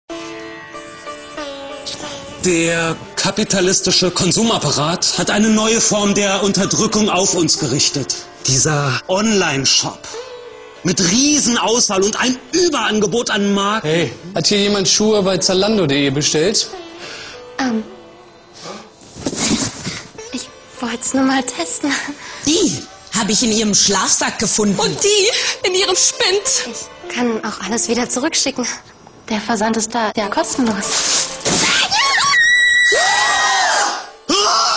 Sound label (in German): Schrei; Zalando Hippie; TV Spot Werbung